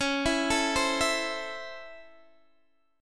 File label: C sharp Minor Major 7th Sharp 5th C sharp Guitar Open D, chord